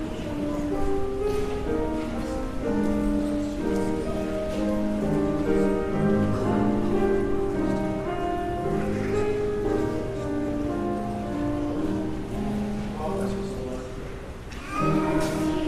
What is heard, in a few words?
Choral